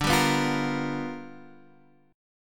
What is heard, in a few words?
G 7th Sharp 9th D